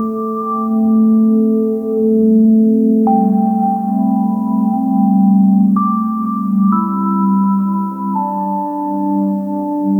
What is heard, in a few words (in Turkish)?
bana loop olarak bir uyku, relaxation